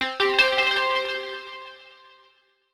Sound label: JUMPPAD; impact; JUMPPAD 02